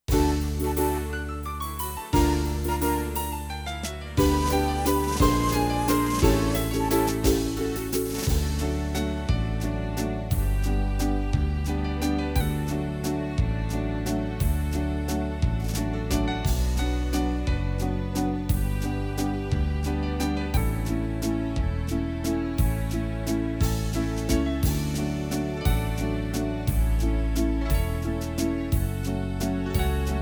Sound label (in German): Pa300 Style Wiener Walzer